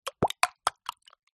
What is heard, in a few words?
cartoon slime gloop slop movement